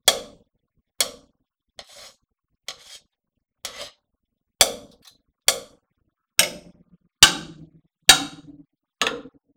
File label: wood